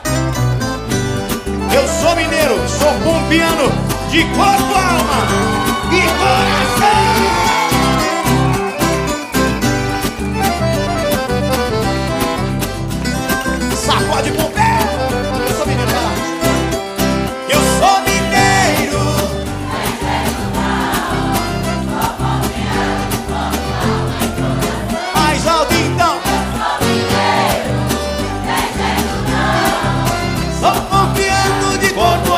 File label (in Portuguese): acustico ao vivo